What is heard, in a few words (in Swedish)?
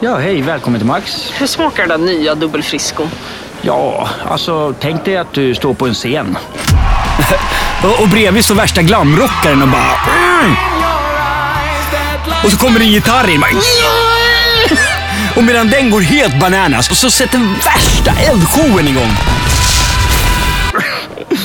rockballad; max dubbelfrisco